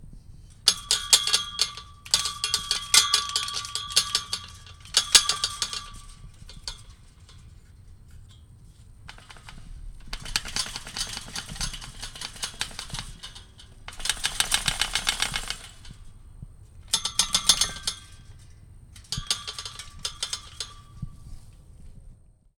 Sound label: Shaking Gate